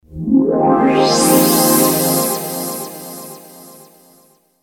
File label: Pad Trans, short; Soundzabound